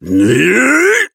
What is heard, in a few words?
effort melee big; Operative